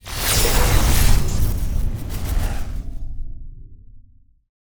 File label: emp, mines; spacemap